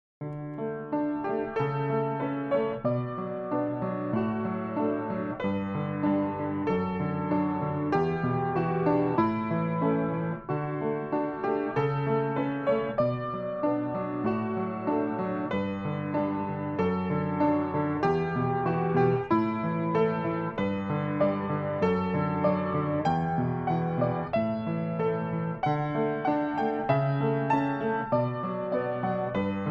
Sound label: Spring Rain